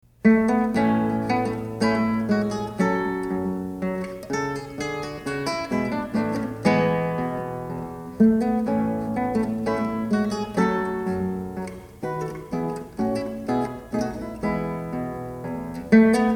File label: baroque guitar - gavotte; handel